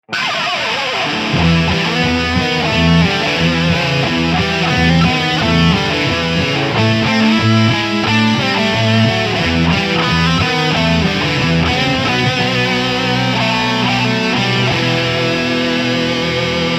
rg420 sature, ibanez; spider

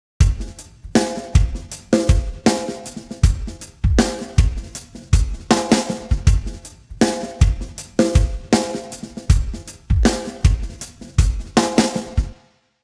drums percussive 133; timestretch; apple logic pro

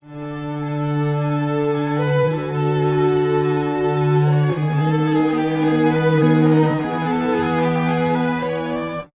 strings, pitchshift; prosoniq time factory dirac

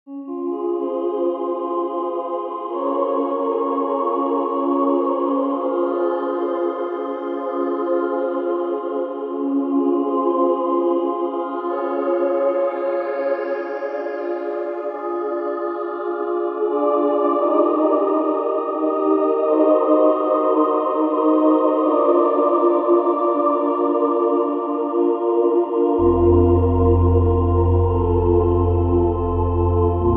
choir; sonic charge; synplant

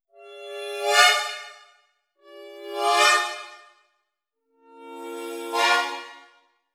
tromps; wallander instruments; wivi player

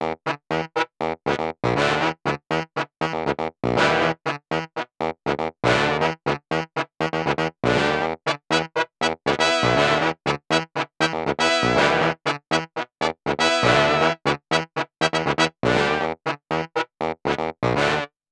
brass sample, Clavia Nord Wave; trombones

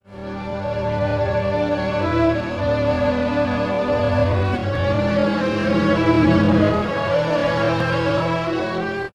strings, pitchshift; digidesign pro tools ts

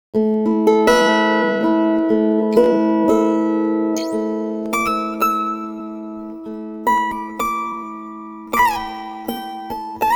pitchshift guitar, Digidesign Pro Tools 12; digidesign pro tools ts

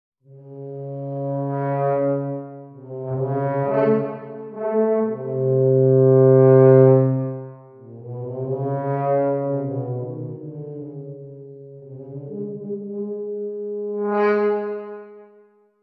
tub; wallander instruments; wivi player

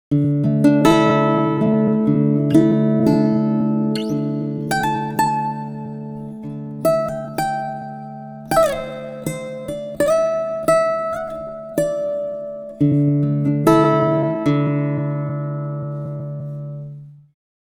guitar, pitchshift; usb uvi workstation

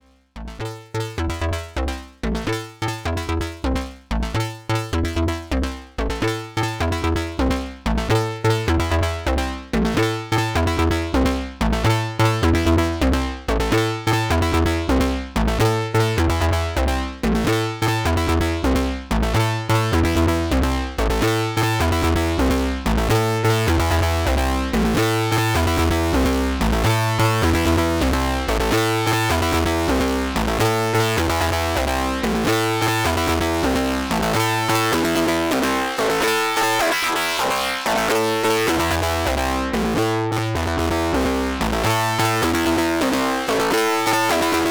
overdrive; ableton live8

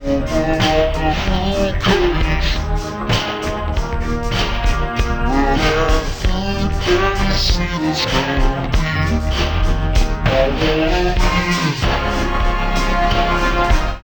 i3 DSP Quattro pitch shift -12

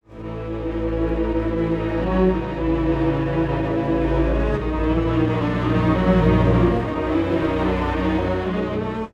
strings, pitchshift; izotope radius

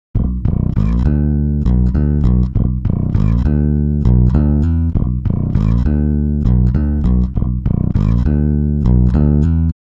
pitchshift bass; ikm sample tank stretch

